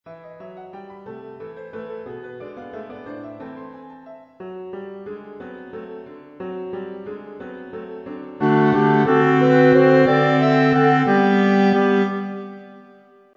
1st Chorus Entrance Tutti; grandduke

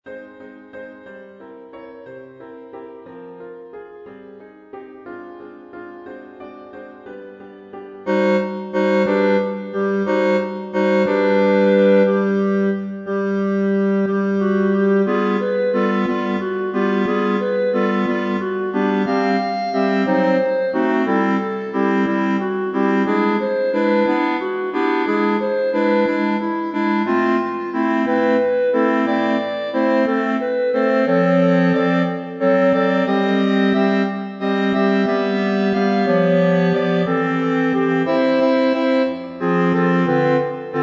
2nd Chorus Entrance Tutti; grandduke

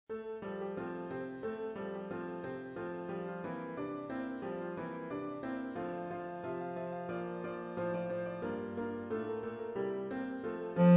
2nd Chorus Entrance Tutti; My Goodness Me What Shall I Do; grandduke

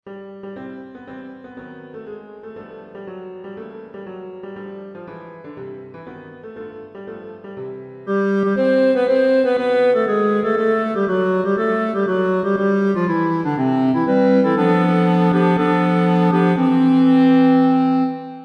3rd Refrain Tutti; Your Loyalty Our Ducal Heartstrings Touches

